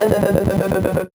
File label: VocoBit Noise, 8-bit; musicradar; 8-bit bonanza